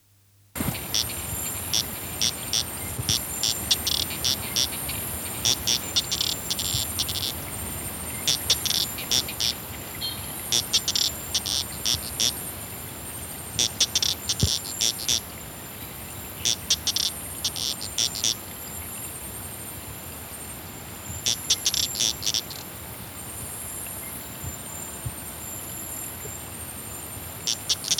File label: Ooeidozyga baluensis; Mulu